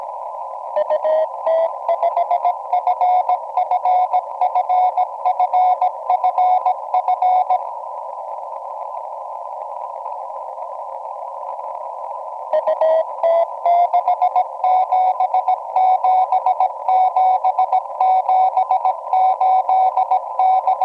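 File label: satellite; O57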